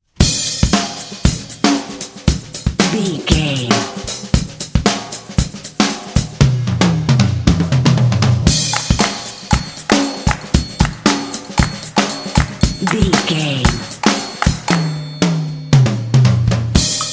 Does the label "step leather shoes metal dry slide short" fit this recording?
No